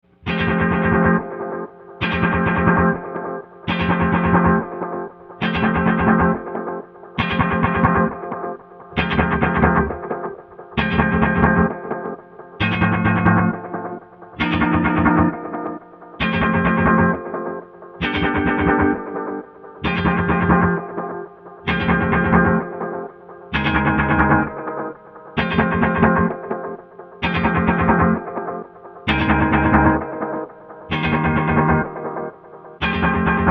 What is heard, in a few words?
bounce back, ghost delay